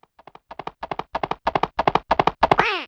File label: Gallop (Fade In), Yamaha DD-35